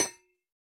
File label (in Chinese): 铜格栅破坏声